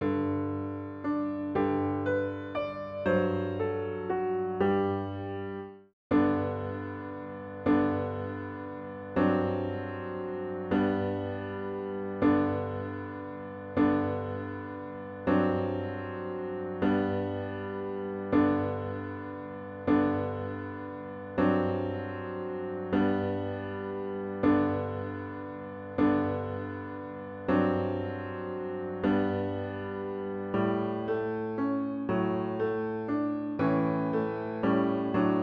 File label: GREEN GRAVEL piano; Children's Song; violinmethod